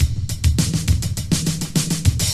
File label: Drum loops; Just Add Drums